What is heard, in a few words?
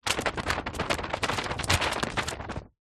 household telephone directory yellow pages page movements many